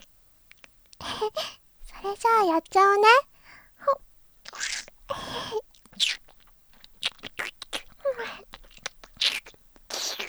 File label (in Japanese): フェラ; 音声ケモノ仕様！; フェラ増しスパイス！